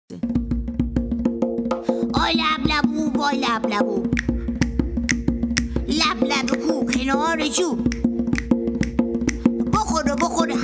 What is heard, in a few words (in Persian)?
هپله هپو